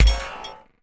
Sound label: Sphere Take; MiSide